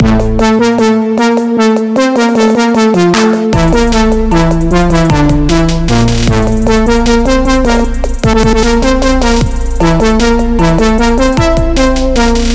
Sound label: phrygia soft